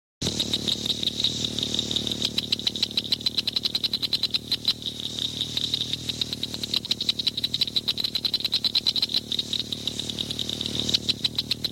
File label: blanchards cricket frog